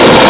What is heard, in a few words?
GUN; DOOM WADS